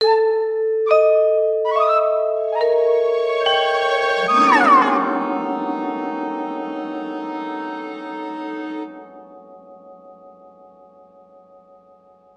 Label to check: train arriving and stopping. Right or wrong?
Wrong